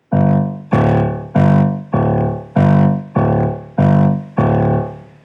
G low D G low D, simple piano recordings

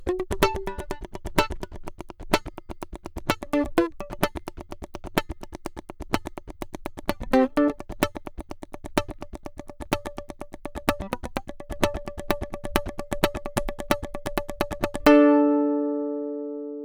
mandolin comparision dual percussive

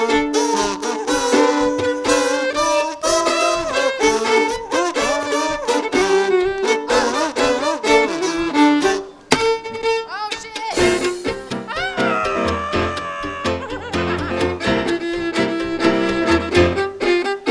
string break

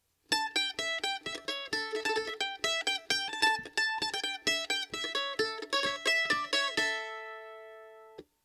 mandolin comparison acoustic

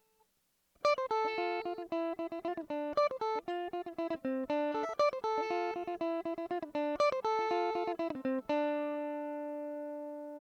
mandolin comparison electric

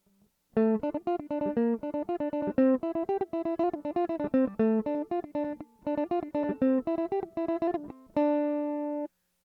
mandolin comparison electric in

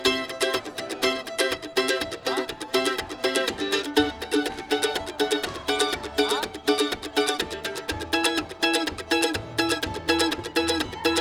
mando

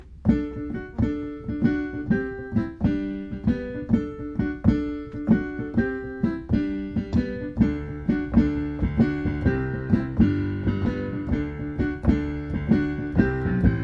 right hand arpeggiated

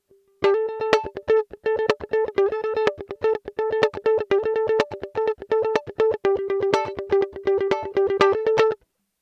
mandolin comparison electric high single

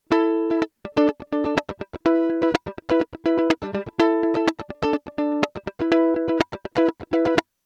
mandolin electric chords

